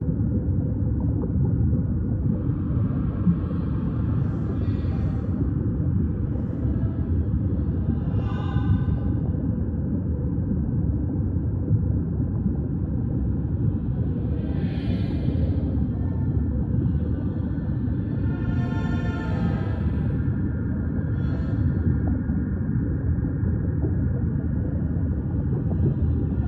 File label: BGM Environment Metro; God Eater Resurrection